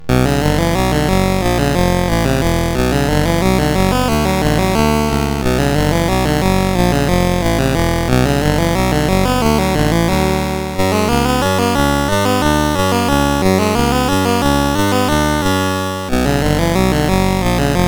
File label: Mountain King, sid CSG8580R5